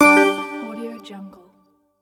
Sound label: ui popup